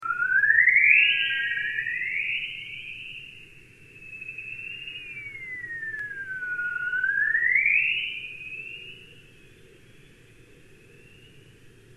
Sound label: plasma waves chorus